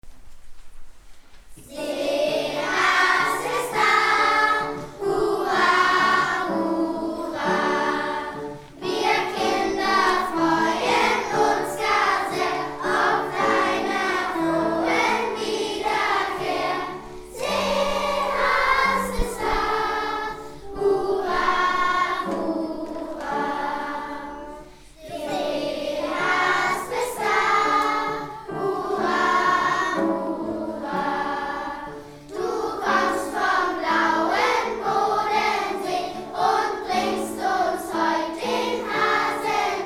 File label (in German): Chor; Fischbach; Seehaas; Seehasenfest